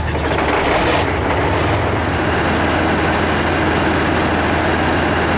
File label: T72